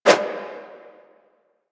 Sticky Bomb Idle Light Whoosh; Grineer; Veilbreaker; Vor

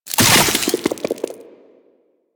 Infested Beam Pistol Empty Clip B; Warframe; Weapons

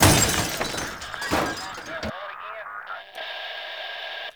destroy television

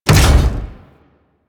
Jump Landing; Warframe; Corpus; Arachnoid Hunger; Steps

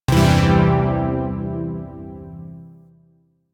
Shawzin Phrygian Chord A; Zariman Shawzin

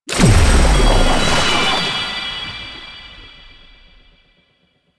Struggle Prompt Start; Warframe; Lotus Eaters; Quests

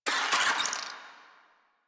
Infested Ashen Vocal Pain; Warframe

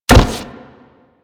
Step Metal, Footsteps; Warframe; Grineer

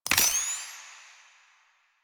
Pistol Laser Aim; Weapons; Warframe; Tenno; Lasrian

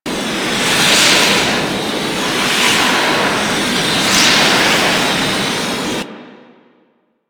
Flak Cannon Proj Loop; Grineer

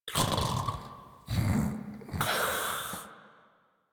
Snorts; Warframe; Deimos; Father; Vocalizations; en

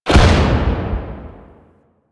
Grenade Explosion; Warframe; Quests; The New War; Kahl; Abilities

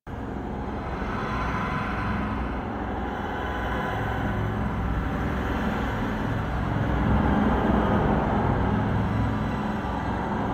Engine Room Amb; Ambience; Interior; Warframe; Orokin

